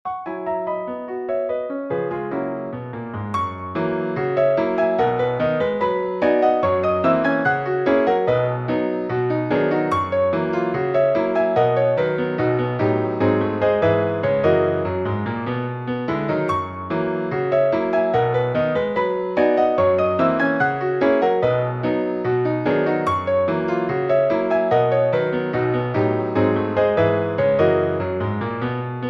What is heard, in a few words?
piano pseudo rag b major; ragtime-like piano solos